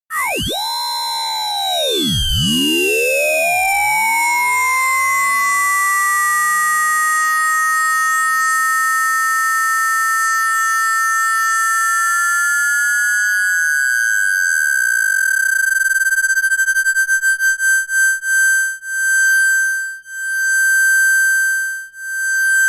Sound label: RingMod